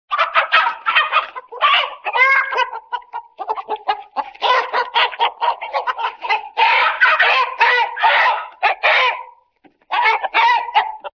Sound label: Hens